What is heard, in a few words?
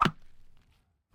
arrow wood, attack impact